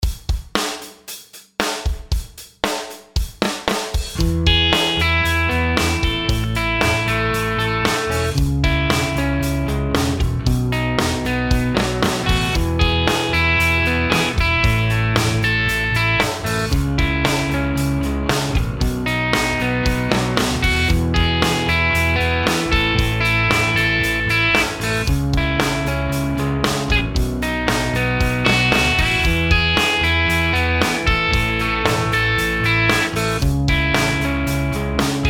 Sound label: chord progs maj root; harmony